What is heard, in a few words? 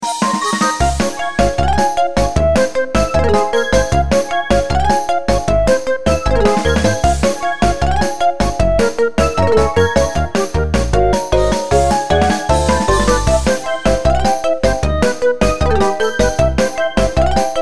Stealing Roulette; MP10